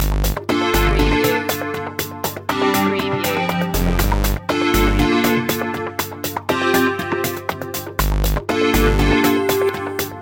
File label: hip loop